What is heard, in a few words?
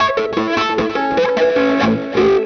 BLUES GUITAR; Sonic Foundry (Sony Creative Software); Vital Drums